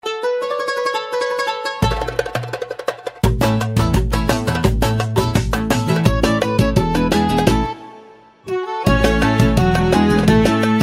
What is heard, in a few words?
Instrumental